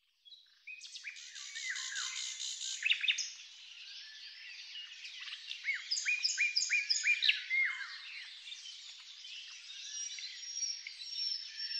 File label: birds cut